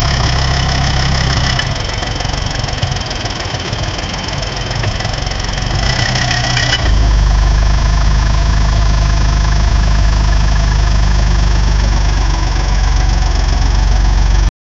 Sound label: opel astra f with bmw, engine rev